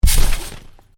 Trampoline backdrop, Trampoline, bounce on: backdrop on canvas trampoline; Miscellaneous Sport; Stuart Duffield